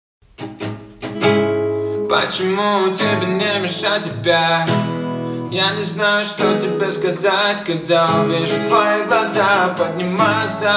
acoustic

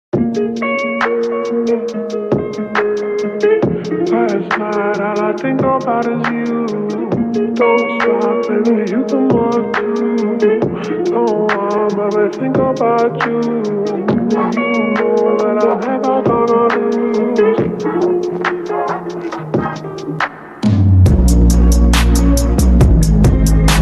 slowed reverb

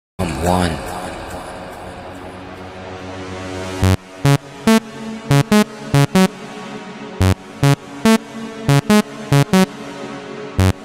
trance mix; tik tok

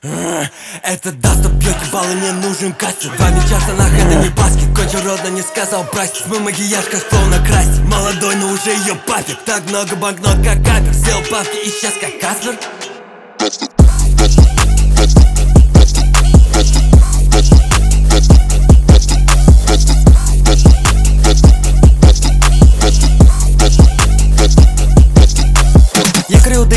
dubstep

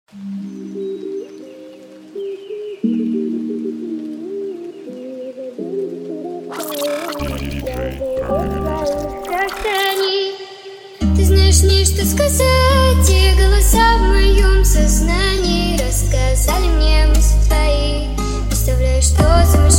trap music remix

